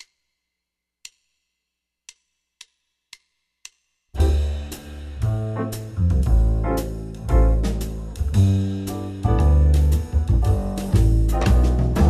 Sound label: tenor, saxophone